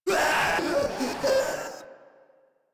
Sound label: Proto Infested Runner Player Spotted; Warframe